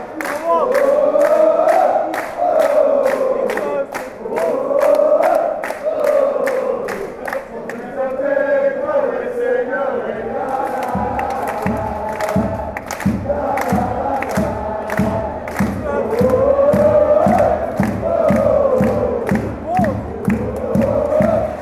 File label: lalalalala oohoo, chants